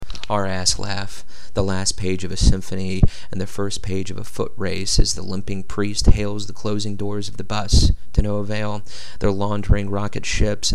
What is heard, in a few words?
laugh